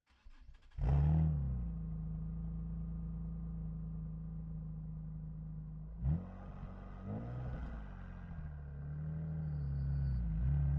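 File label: Nissan 370Z Onboard Start Drive Steady RPMs Exhaust Right; 2012; DPA4062; Pole Position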